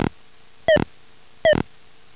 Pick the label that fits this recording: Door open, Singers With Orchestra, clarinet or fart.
fart